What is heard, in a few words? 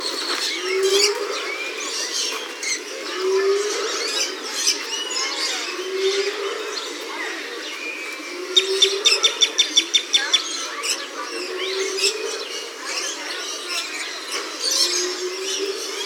Hyena